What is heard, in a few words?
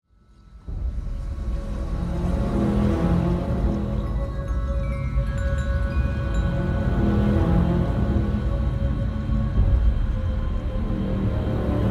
tension